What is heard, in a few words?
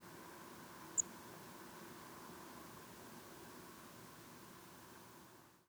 simple modulation